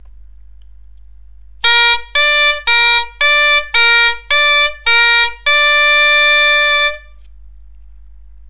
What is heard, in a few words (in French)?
Arpиge si rй, bombarde; Si Ré Si Ré